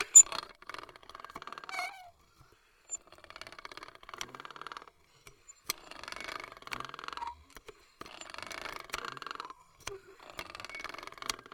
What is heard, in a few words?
antique grinder wood slow speed; the soundcatcher